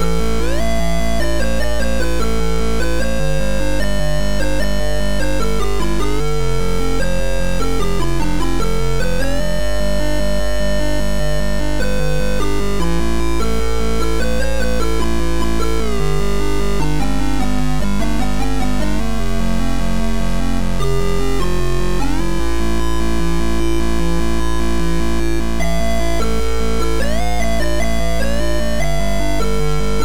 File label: Amiga Chiptune